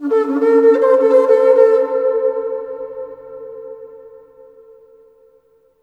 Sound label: HRNPHR, Heart of Africa; AMB. HRN FX